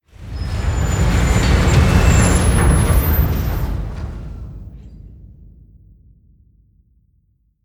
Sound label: Floor Elev Gears Stop